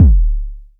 small reverb kick drum D key, Royality free bass drum sample tuned to the D note. Loudest frequency: 245Hz